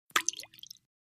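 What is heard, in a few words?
Splash Small Plop Glass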